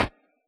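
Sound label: snare drum Elect., Yamaha DJX; 155 DJX Kit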